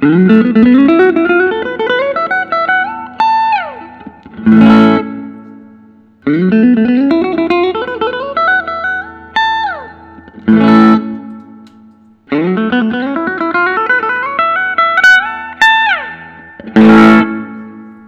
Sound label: gibson custom sg special vos faded